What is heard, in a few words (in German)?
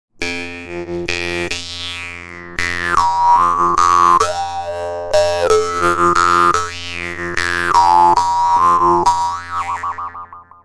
Maultrommel